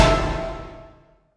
boss appear; Game Events

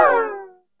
slow effect; Tower Defense; freepixel

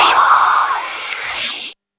creepy whisper; Horror

